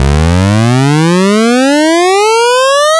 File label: NES FX Build-Up, chiptune; Sonic Foundry (Sony Creative Software); Nintendo Entertainment System